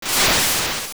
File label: Escape; Master Stalfos escaping to fight another day